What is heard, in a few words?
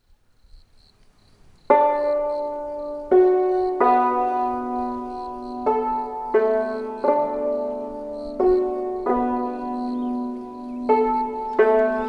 ensemble